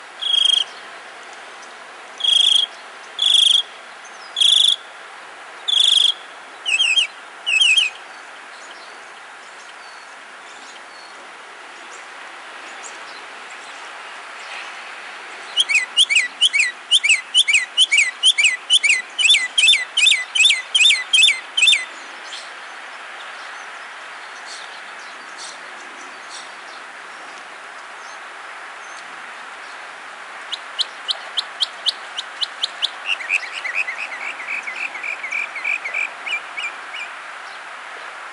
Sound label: mock song, birds